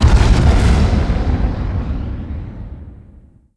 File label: BLAST; ImpGal2; spacewar